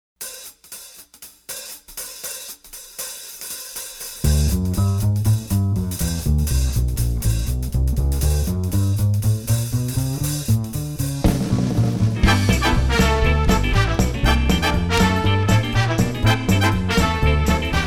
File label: Full Accompaniment; Grade School Choir